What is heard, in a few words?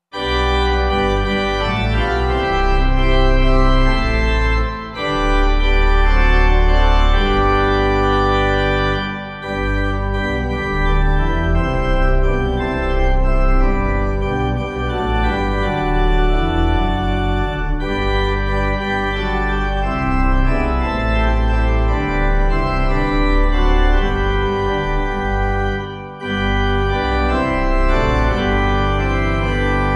Pipe